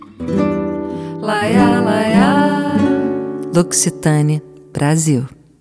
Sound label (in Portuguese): logotipo sonoro; loccitane brasil